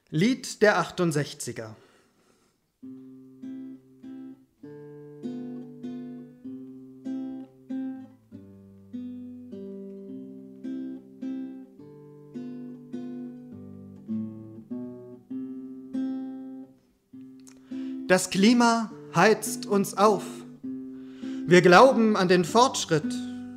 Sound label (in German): Musik Gedicht; lied der 68er